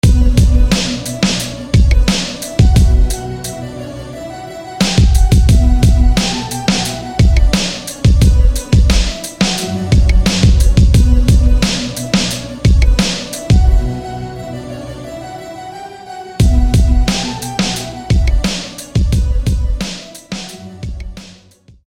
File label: Harp of Despair; SFB FX